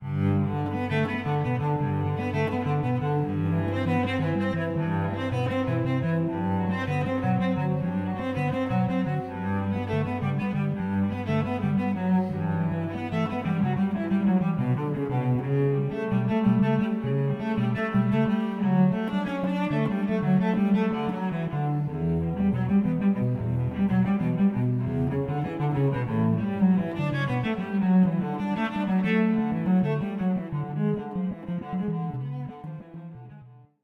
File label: Bach Cello Suite 1, ringtones Calm; Samsung; Galaxy Z Fold8 Ultra; Sound Theme